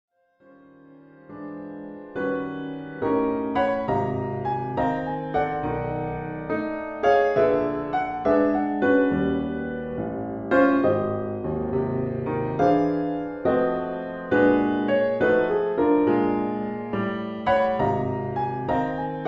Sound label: old time piano